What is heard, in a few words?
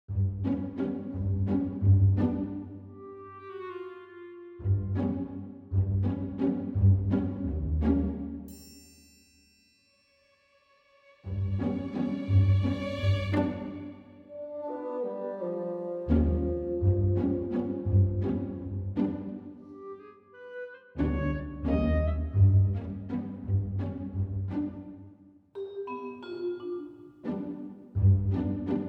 Quirky